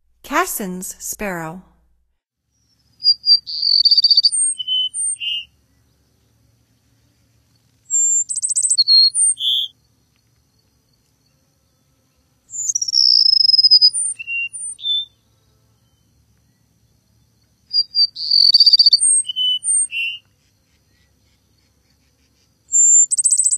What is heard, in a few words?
Cassins Sparrow, Songs of Birds of North America; Stokes